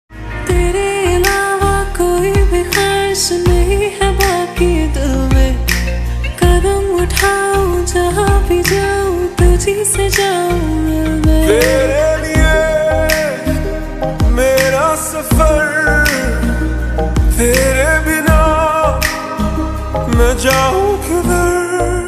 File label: lofi remix hindi ringtone